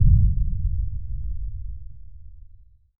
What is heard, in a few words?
muted distant explosion, battlefield